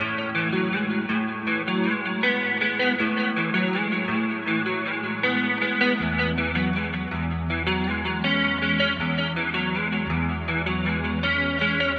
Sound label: Romance 80 A Guitar Riff, Dream Pop Guitar; Guitar Hypnosis